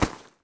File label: bodyfall grass, foley